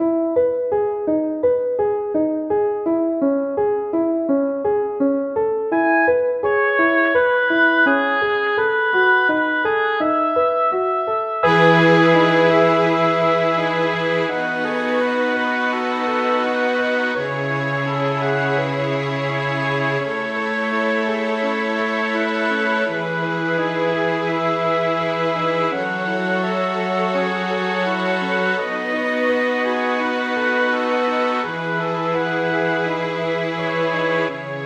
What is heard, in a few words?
documentary